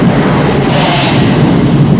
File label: ship gun